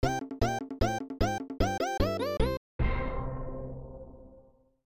Bonus End; SMW Remade From Memory

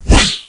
SLASH

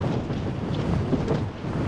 Airship Move; Character Airship Move

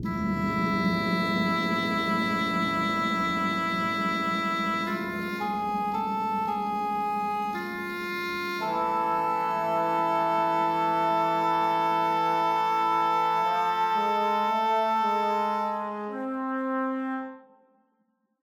Rnd Melody; tintin reporter cigars of the pharaoh

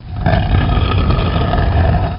slab move

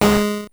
multiplier G#4

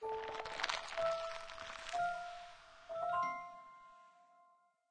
Jingle Flower; Year Walk

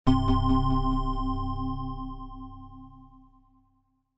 Sleep; Mind Scanners

